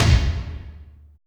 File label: RAMROD, FILM PERC; Drums In Your FACE!